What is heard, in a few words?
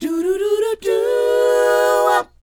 DOWOP C#, Vocal R&B DOO WOP; ILIO; Vocal Planet